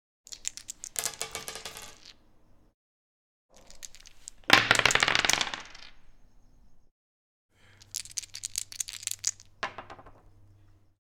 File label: Dice